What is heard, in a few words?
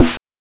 BANK1 SD, NES DPCM; MIDINES